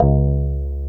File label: NASAL BAS, Electric Basses; PlatinumPhattCD2; E-MU Producer Series Vol. 8 – Platinum Phatt